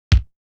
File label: bassdrum